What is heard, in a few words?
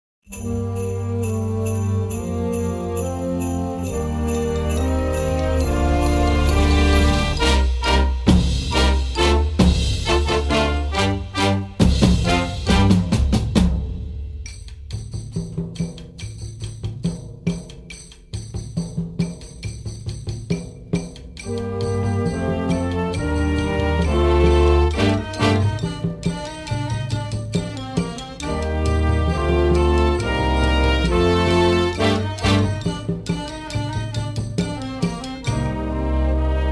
Thunder Drum